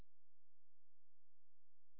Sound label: silence; Tetris Max 2.3